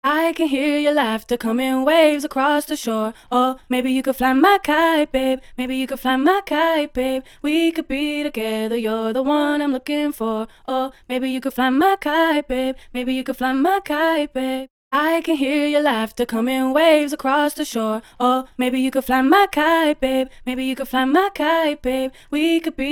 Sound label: sample vocal